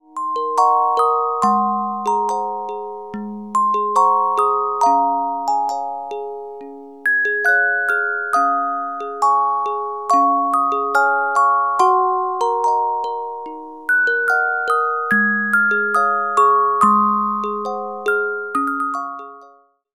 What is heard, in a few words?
Carillon Music Box Melodies